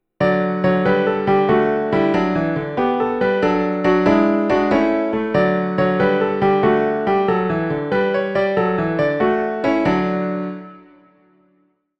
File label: Twinkle, Twinkle, Little Bat!, Piano